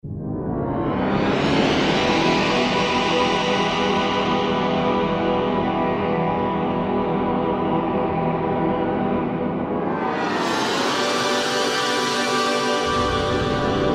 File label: Glide Sweep, Korg N364; Factory Prog demos